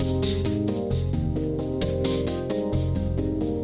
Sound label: Funky 125-132 Fm7 9; Loops